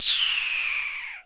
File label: mouth queeg, familiar but useful sound (effect) done by mouth